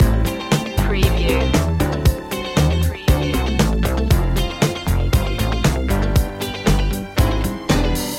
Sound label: boogie disco loop